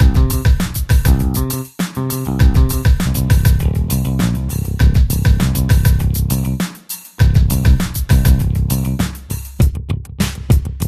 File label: virtual bassist test busy rock; steinberg